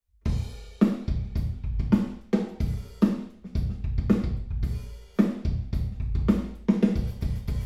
drums, binaural; em32 Aalto

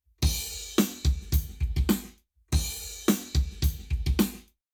Lab Wet Drums 0to360deg; eMagLS

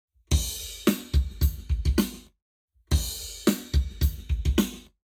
Lab Wet Drums 0to360deg; eBreve

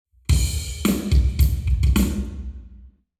Hall Drums 0 deg

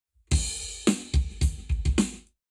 Lab Wet, Drums 0deg

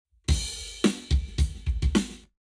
Drums, 90deg; eMagLS; Lab Wet

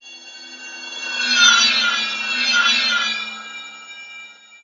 eight wheels

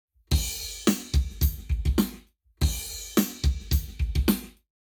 Lab Wet, Drums 0 to 360 deg

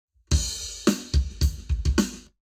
Drums, 0deg; Lab Wet

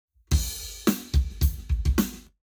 Lab Wet KEMAR Ear Drums 0deg

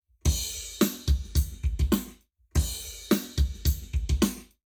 Lab Wet Drums 0to360deg; eBreve

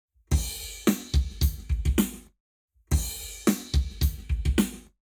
Lab Wet Drums 0to360deg

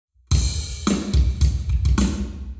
Hall Drums 0deg; eMagLS

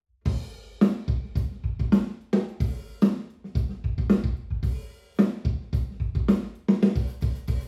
drums, binaural; em32 Aalto

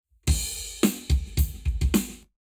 Lab Wet Drums 0deg

